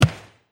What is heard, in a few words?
Kick